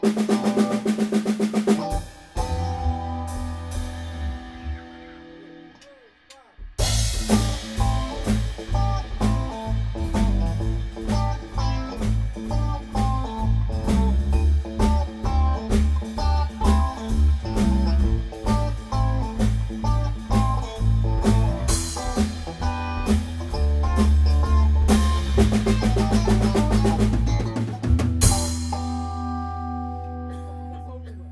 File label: Blues